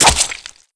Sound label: combat knife hit flesh; tf2